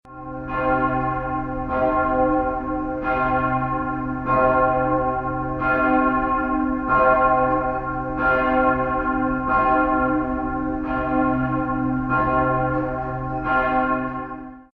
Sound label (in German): gelaeut; andreaskirche